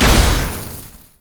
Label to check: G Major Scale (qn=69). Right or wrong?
Wrong